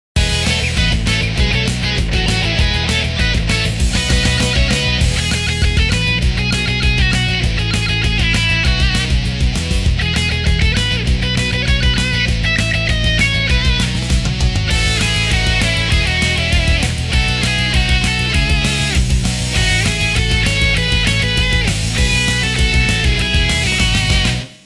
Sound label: Punk clock alert; Nokia; Audio themes